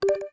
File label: Pause Menu Save Continue Cursor; Move the cursor on the Save or Continue menues (also used for saving or erasing with the Picto Box, and a